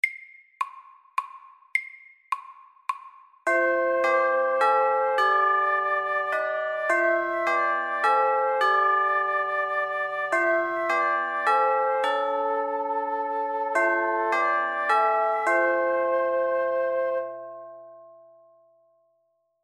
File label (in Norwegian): Klokkekling